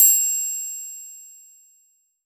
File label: Triangle Open, Percussion; Roland BK-5